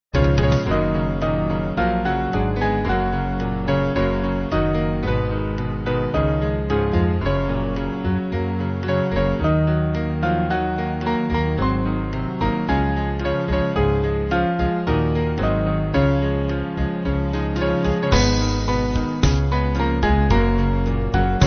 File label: Band